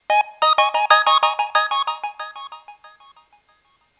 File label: Hospital Code